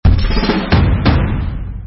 Tongits fight challenge

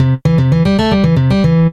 roll start

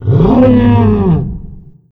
animal

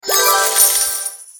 receive reward

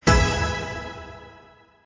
win icon

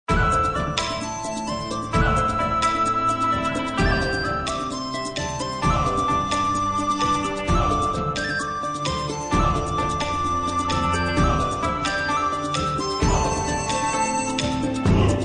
super mega win loop

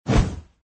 run

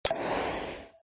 roll start

